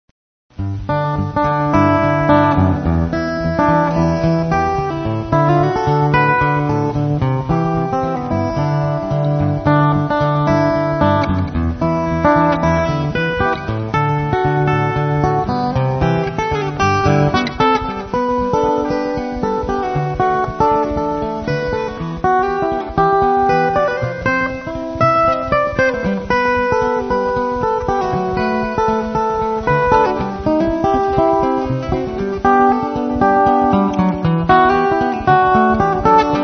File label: guitars